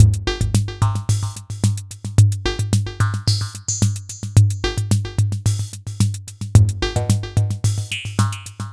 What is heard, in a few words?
Drums Strange